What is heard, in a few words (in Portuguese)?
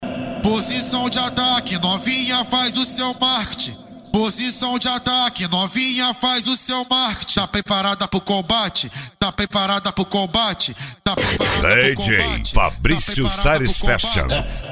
funk e lambadao; carreta do bolachinha